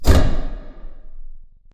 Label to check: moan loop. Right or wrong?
Wrong